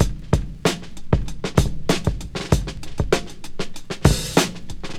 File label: Breakbeats